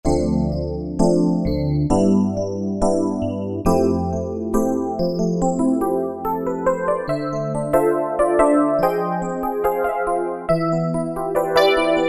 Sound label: Galaxy EP, Yamaha PSR-3000 E. Piano; Factory Voices